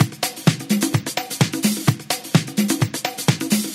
House Loop, 128 BPM; Vengeance Samples

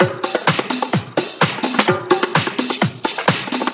Latin House Loop 128 BPM; Vengeance Samples

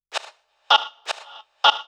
House Loops, Vocal Loops House 128BPM

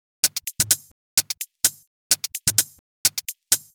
Minimal Loops

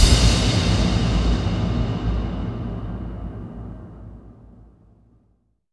Bassdrums FX, FX Kicks; Vengeance Samples

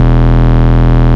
Root E, Bass

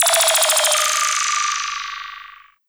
Lazers

